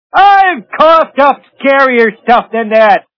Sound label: cough dup